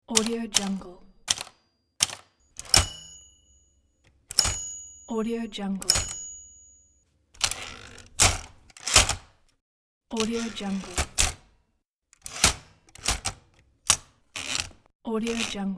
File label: typewriter